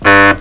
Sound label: tone